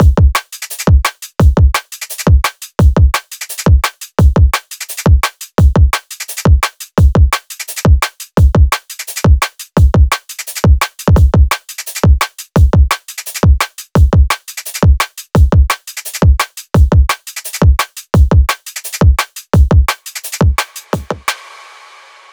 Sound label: Drum Beat 172bpm, drum bass; DNB BASS PAK; DECODEDDRUMBASS Zenhiser